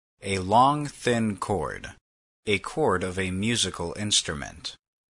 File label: string